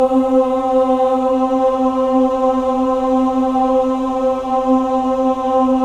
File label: MEN AH, Choir of Ahs; Roland - LA Composer vol. 1